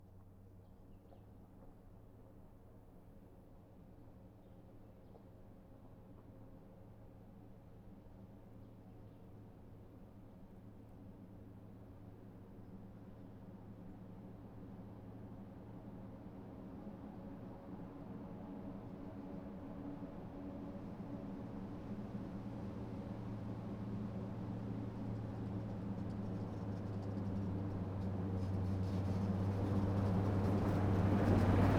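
M8 exterior passby stop turnaround away var speeds, Armored Car; M8 Greyhound Armored Car; Schoeps; Pole Position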